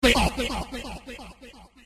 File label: old man grunt; mission folder that appears when you have taken too long in a ghost house